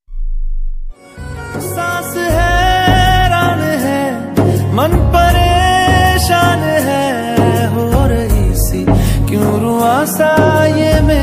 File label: sad song ringtone; dangal; amir khan